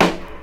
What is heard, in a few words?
nice steel snare drum sample f key; snare drum samples